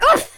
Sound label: oof, Scotsman; speech